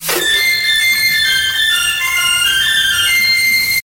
Songbird Call

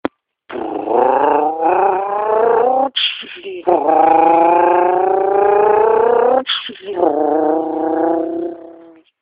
Human Subaru

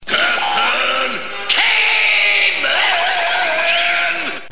Caveman

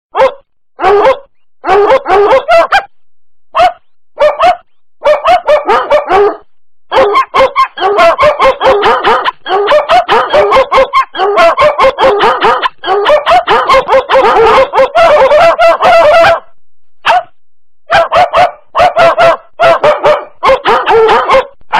Dog Bark

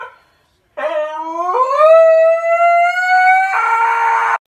Dog scream

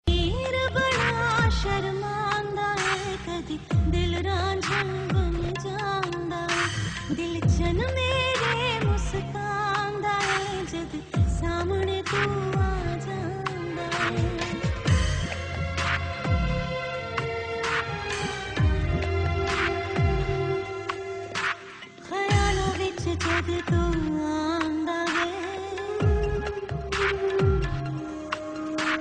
Nice Punjabi Song

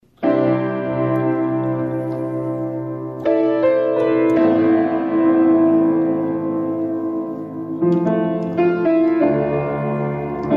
Piano improvisation

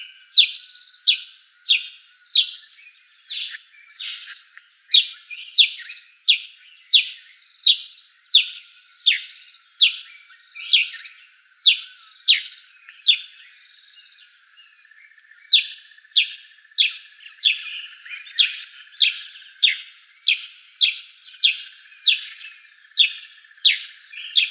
Grey Headed Sparrow

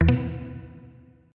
Short soft message

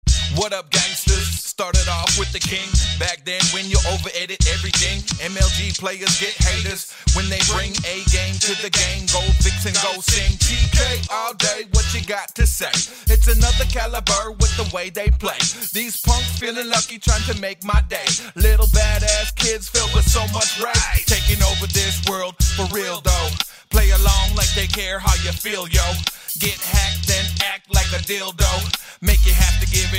rap song

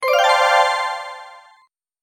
Alert Swoosh

